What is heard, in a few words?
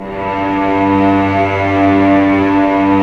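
Cellos Ponticello, Bow FX; Roland LCDP08 Symphony Orchestra; STR Vcs Bow FX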